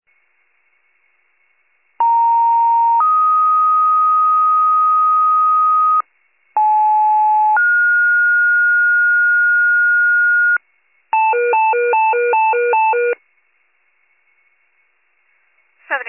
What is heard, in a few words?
fire, pager alert